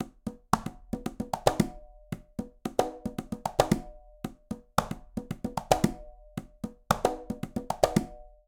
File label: Calypso A Conga-113bpm; Latin Percussion; Ableton; Factory Packs